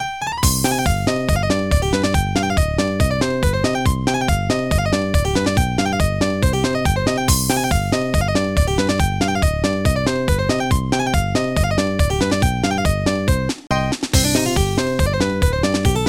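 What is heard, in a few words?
polka, soft piano; Digital Symphony Collection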